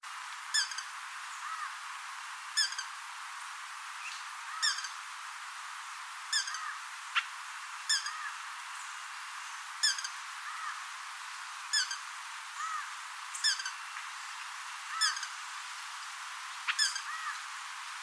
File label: Brazilian ruby; پرنده یاقوتی برزیلی